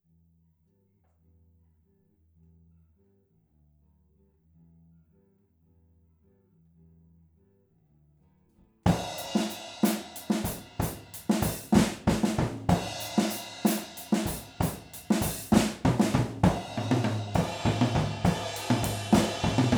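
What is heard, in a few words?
Rode OH stereo Drums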